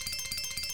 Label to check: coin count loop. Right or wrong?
Right